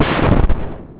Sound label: shell; hornet